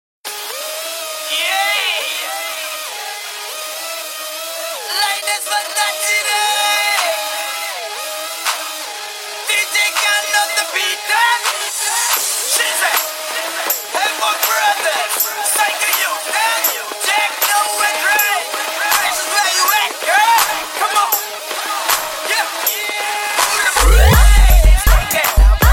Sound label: Tamil 2016 Remix Songs